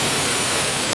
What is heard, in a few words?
sequence of smooth particle; particles